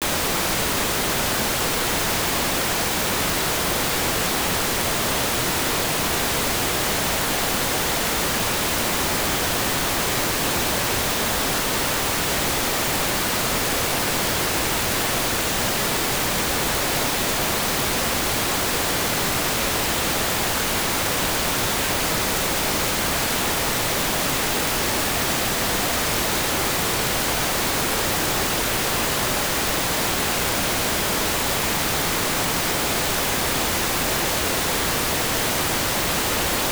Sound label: pink noise